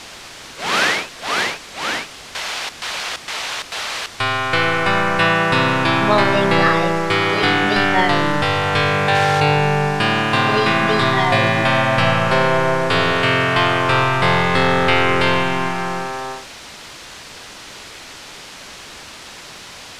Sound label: rehearsal room; realistic demo